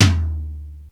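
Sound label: tom low; xr10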